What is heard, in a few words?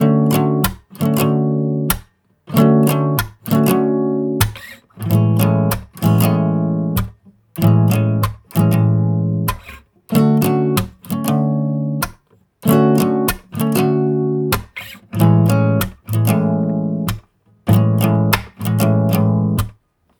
Guitar Loop A Major 95 BPM